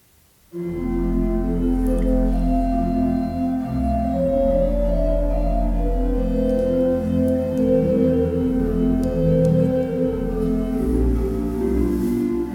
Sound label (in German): zimbelstern floete, orgelmusik; pankratius worringen